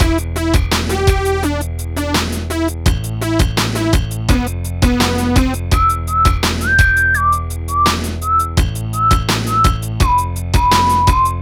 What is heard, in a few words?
mus loop; Roblox Doom